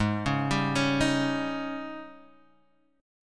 G sharp Major 7th Suspended 4th G sharp, Guitar Half Step Down